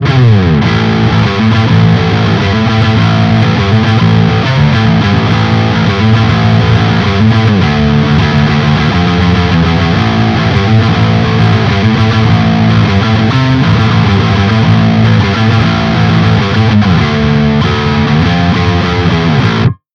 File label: bass overdriven